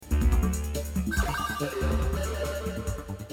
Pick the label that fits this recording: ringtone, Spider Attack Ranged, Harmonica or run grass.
ringtone